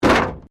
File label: Wreck Door Panel Clunk Metal